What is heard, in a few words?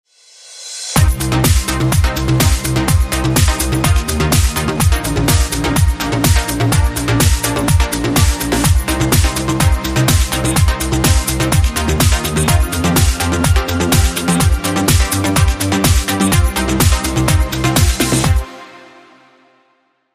EDM